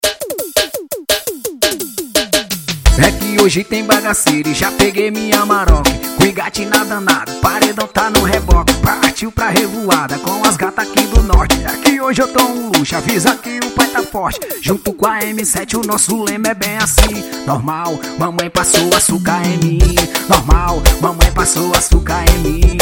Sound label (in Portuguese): piseiro